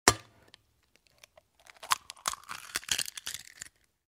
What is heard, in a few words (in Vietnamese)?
Tieng qua trung vo